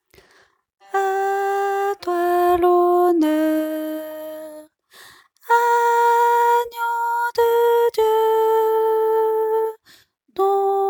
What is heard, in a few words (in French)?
basse